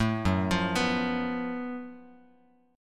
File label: F sharp Minor Major 11th, Bass Standard, chord; arpeggiated; JGuitar